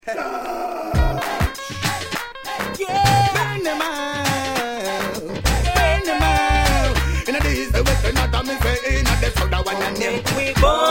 punjabi riddim

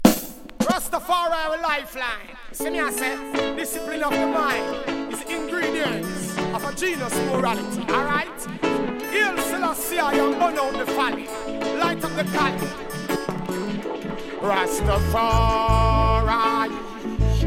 rebel reggae riddim

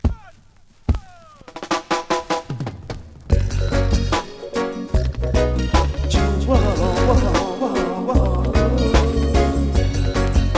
riddim

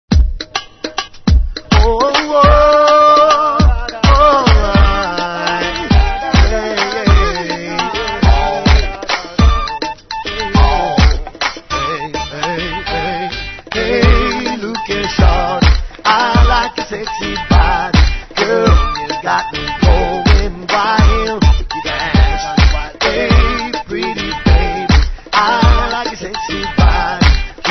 riddim

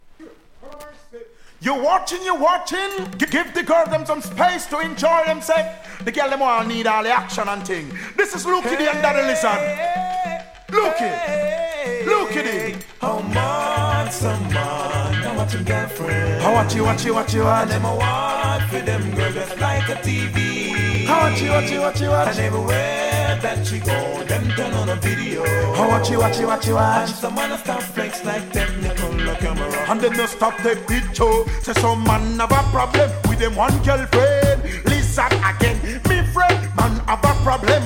crowd riddim

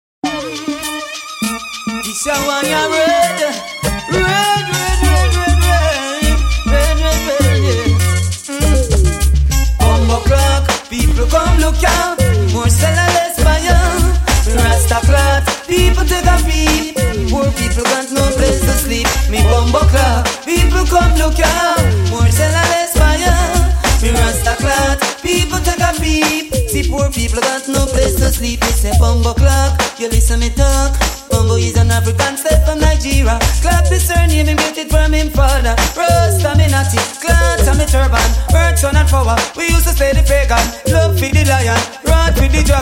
riddim

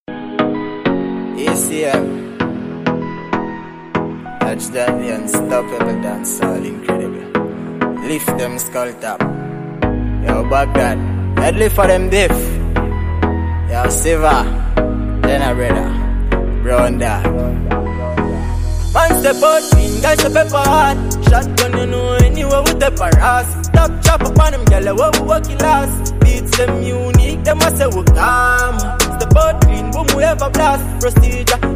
riddim

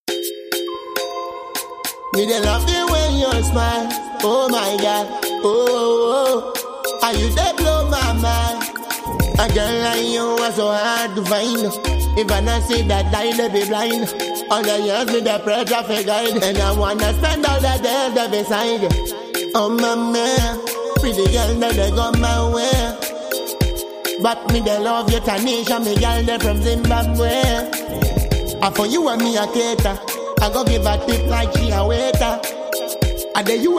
riddim

